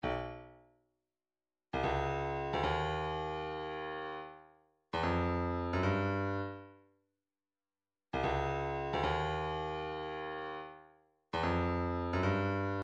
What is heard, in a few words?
bongo, midi